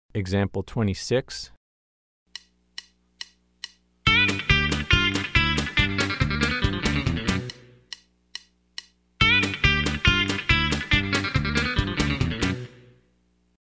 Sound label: Country Rock